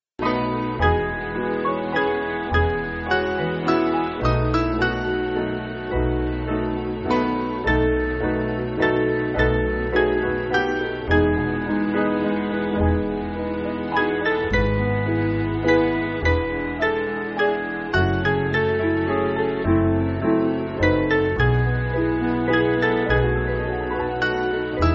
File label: Piano